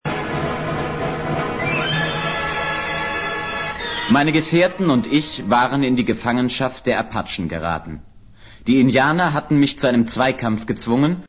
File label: western